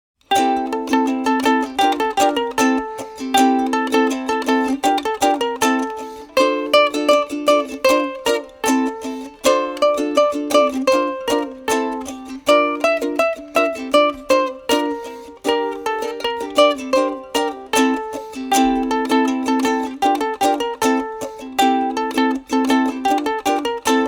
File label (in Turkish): Elini Yika Instrumental, Ukulele; Ukulele Ile Cocuk Sarkilari